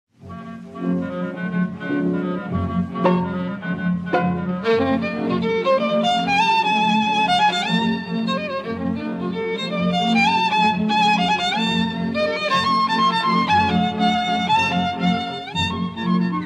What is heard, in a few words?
Levee Dance, Violin, LIVE RECORDINGS; WHITE